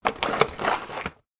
game monopoly game metal playing pieces take out of box tray